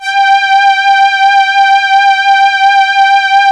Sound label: SYN BOW STR, ENSMBL; AKAI S-Series CD-ROM Sound Library VOL-8